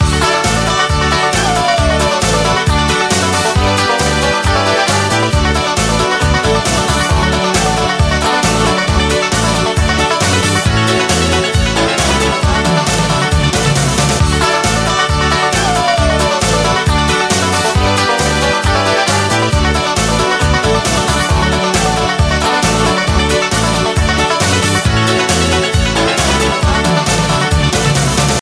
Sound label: Banjo Country